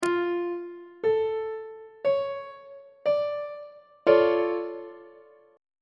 e double flat minor major 7th 1st inversion on treble clef